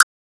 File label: game click ok; theodyssey